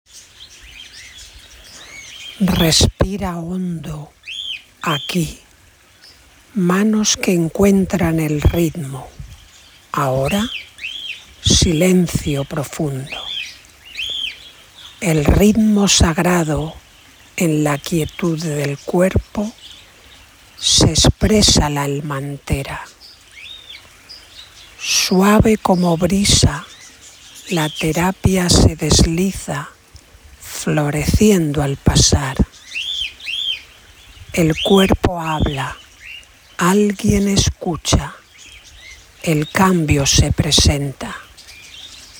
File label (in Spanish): Ritmo Sagrado